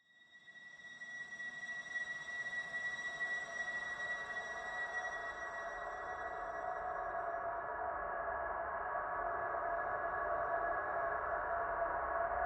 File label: Horror Atmos Evolving